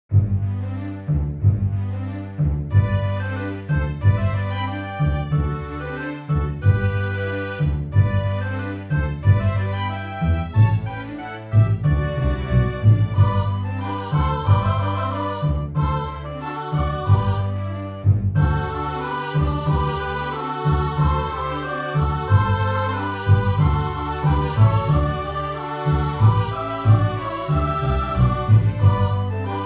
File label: Kling, Christmas synth audio; natale